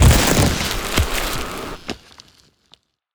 ABYSSM attack

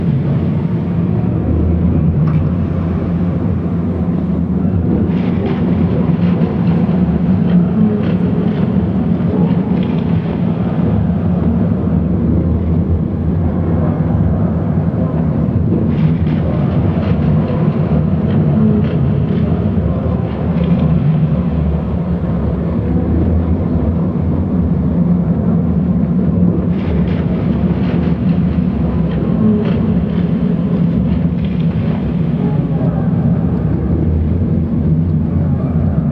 MONSTER far Layer

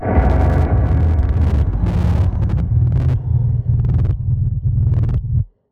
WATCHER death

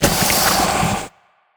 ABYSSM tongue move

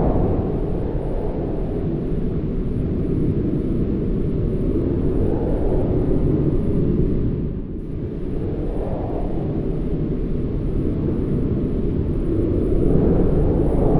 MOLOCH damage

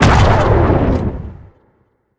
laser Gun Shot; WEAPONS

